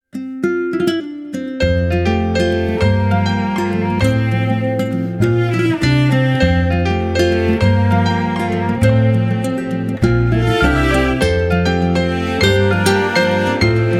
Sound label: Joyful Life Is Wonder, ringtones; LG Wing 5G Verizon